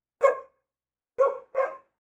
dog sounds short

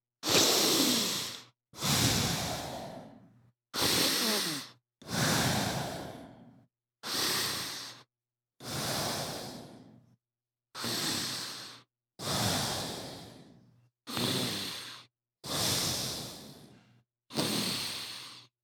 heavy breath sounds

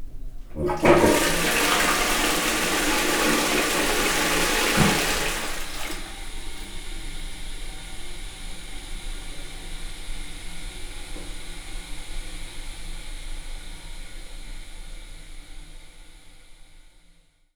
short flush

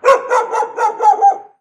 dog barking sounds

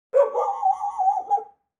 dog sounds

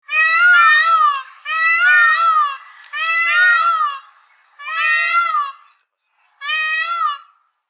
peacock sounds long

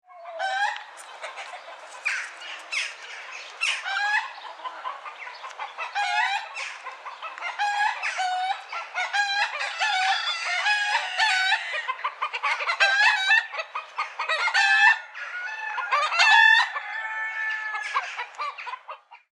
turkey sounds

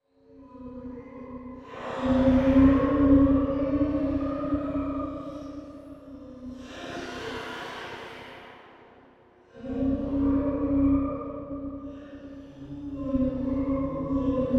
ghost sound

